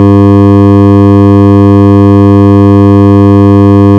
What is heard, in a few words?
fm, FM Modulation